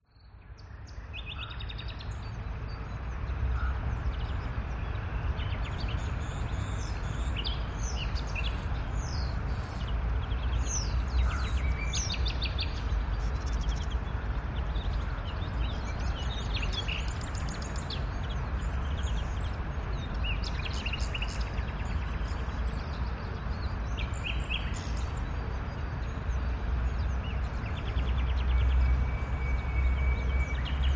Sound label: Morning Park Ambience; west wolf